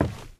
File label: WOOD, steps; Terrarum